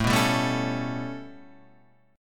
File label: B 7th Suspended 2nd Sharp 5th A